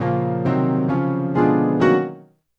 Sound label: Piano, Acoustic Pianos; Sonic Foundry (Sony Creative Software); Mac Money